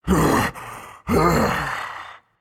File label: breath, voicepack; smite; jorm